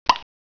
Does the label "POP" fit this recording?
Yes